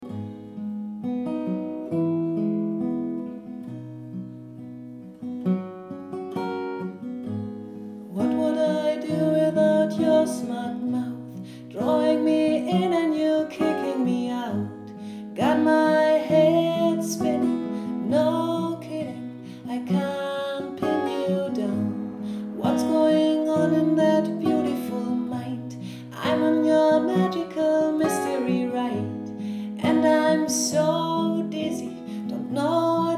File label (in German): Gesang Probe